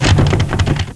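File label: rattle ball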